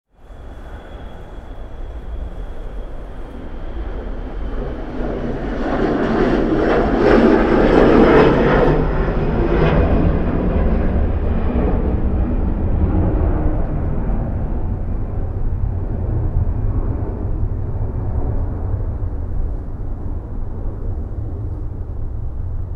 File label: jet takeoff